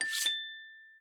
block tile flip

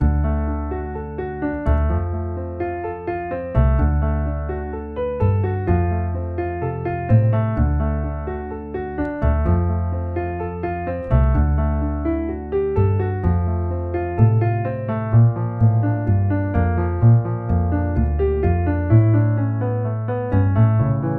block background music

